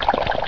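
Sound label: Liquid Splash; Mythos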